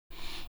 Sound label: br Medium, BreathWav; VOCALOID6 AI Megpoid SOLID Voicebank; Vocaloid 6.5.1